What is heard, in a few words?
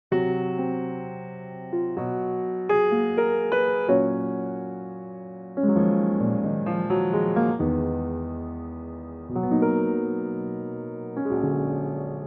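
Eb minor Piano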